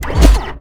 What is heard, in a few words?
biomech lancer punch